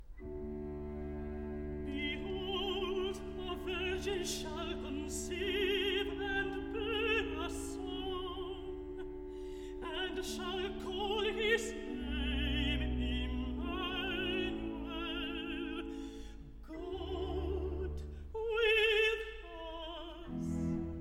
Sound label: alto; part 1, no 8, behold, a virgin shall conceive